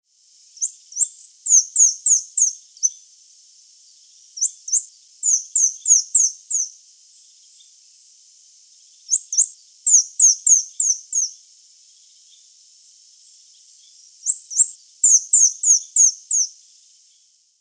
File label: Arremon flavirostris; Limoy